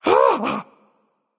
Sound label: breath, voicepack; smite; jorm